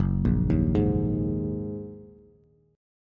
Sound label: Gb 6th Add 9th Gb down Bass; arpeggiated; Gb6add9; JGuitar